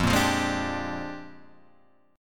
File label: Bb 13th F